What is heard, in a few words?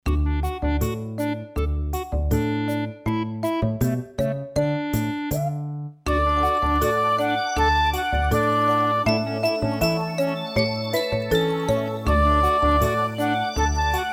Melody